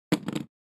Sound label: object drop